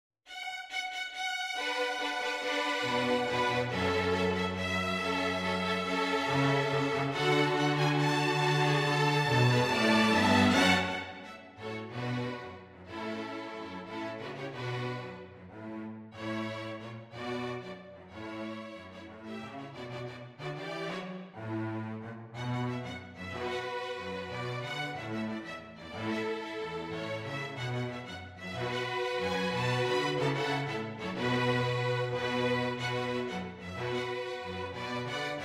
string 4tet, jazz arrangement for string quartet; drunken sailor

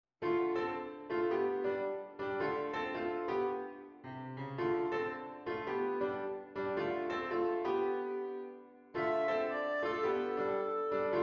CLARINET; bass clarinet

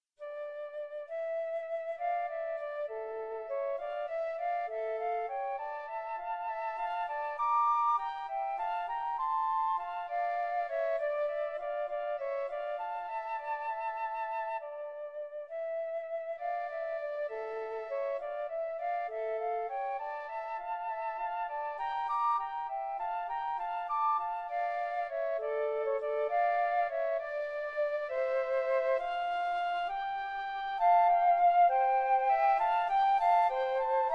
flute, flute duet

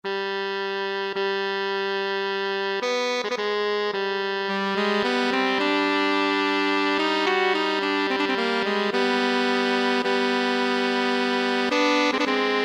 Sax AT DUET; saxophone duet; Hava Nagila

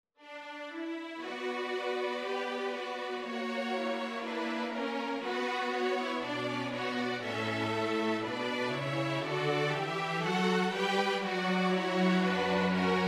String quartet

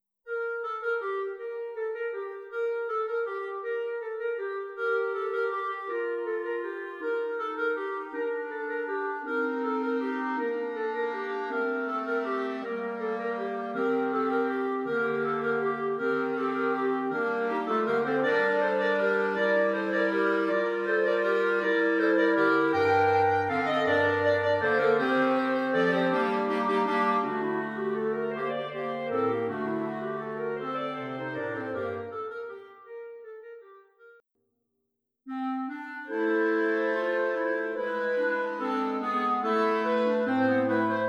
clarinet quartet

